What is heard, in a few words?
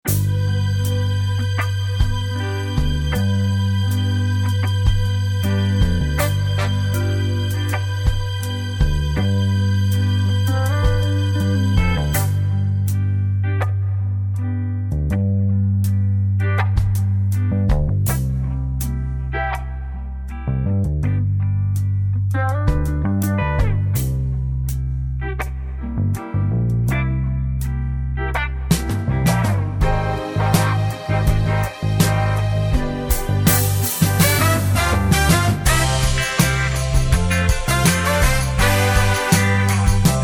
C No Backing Vocals, backing tracks